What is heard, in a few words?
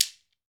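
GHANA shaker, African Perc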